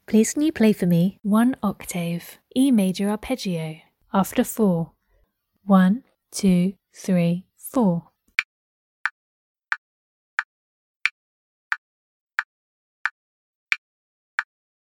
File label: e maj arp